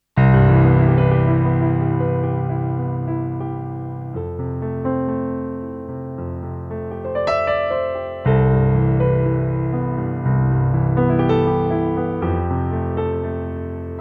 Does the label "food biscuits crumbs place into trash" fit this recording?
No